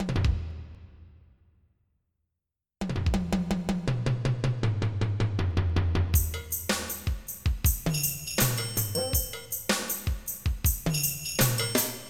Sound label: gm drums; 3800 MIDI Magic